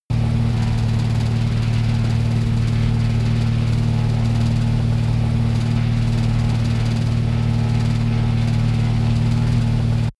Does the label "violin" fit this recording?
No